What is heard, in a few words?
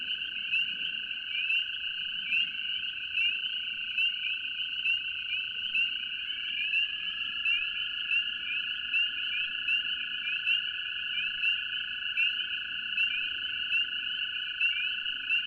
am toad and peepers